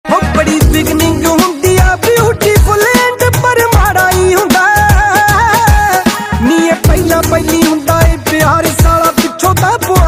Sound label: Ringtone